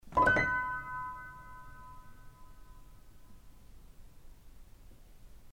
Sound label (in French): accord 2 sec vrai Gaveau, piano